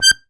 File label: ZUPAN STR, AKKORDEON; Best Service; Alpine Volksmusik